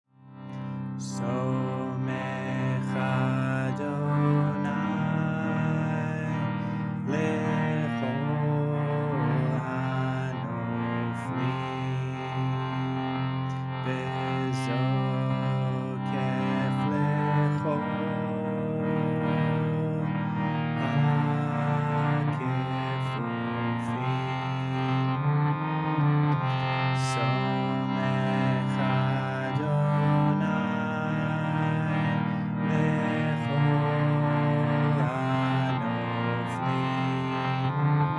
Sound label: chant; samekh